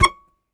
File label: Very Short Note, Melodica